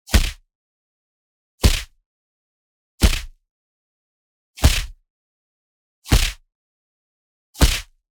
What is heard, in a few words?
PUNCH Distortion Crunch; boom library; Anime Essentials